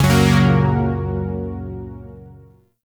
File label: Yo Chord A, Zariman Shawzin; Music; Warframe